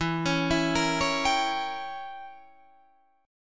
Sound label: E Diminished 7th E, Guitar Drop D